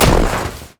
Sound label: snowday qspellbounce; LoL; ziggs snowday